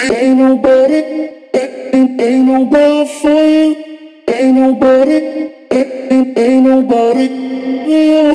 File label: vocals wet; folda; sentinel av